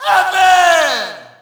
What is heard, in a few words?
Shout, audience; UFC Tapout 2